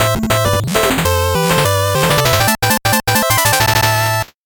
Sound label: 8bit jingle fast